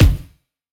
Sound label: normal hit normal, Taiko; osu